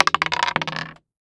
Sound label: Dice Roll Single; Seer; GamigoNA